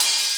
HH, 170 BPM, Drum & Bass; Ueberschall; Drum & Bass Flash Trackz

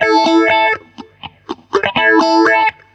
GTR, Roots Disco; USB Soundscan vol.32; AKAI

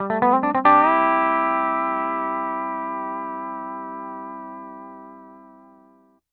LOOPS 140, Country

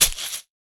Household Shaker, household rhythm samples Hits

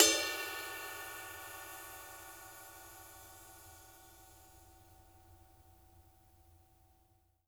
BELL, cymbals; Sampleheads - New York City Drumworks VOL-1; SW CYMBALS

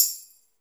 PERC, 160 BPM, Drum & Bass

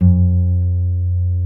G 2 nylon, Guitars

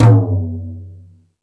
Thundadome, E-MU Pro-Cussion

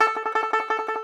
Tenor Banjo, Plucked Strings

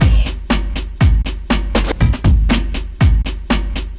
Lo Fi Loop, Drums & Percussion, Electronic; Sonic Foundry (Sony Creative Software) - Electronic Point Blank

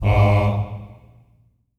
Staccato F G#2 L, Symphony of Voices; Spectrasonics

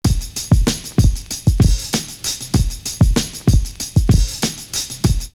Rap Beat, drumloops, OldSchool; X-Static Goldmine

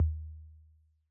Analog Tom; Yamaha DJX-2B

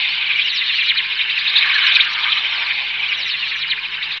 weird; Chillout (ambient1&2)

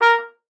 STAF A#4, TRUMPET; Best Service ProSamples vol.42

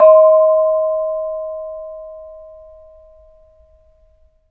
BONANG, GAMELAN SET; Spectrasonics; Heart of Asia

